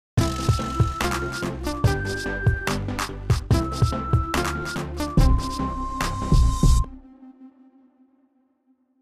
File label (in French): synthe